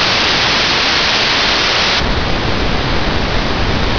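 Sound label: white noise; Terra; TERACIDE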